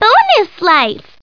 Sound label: bonus life